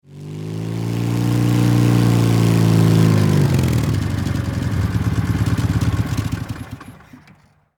Motorcycle Drive Up Turn Off Bmw R71 Military World War Ii; Vehicle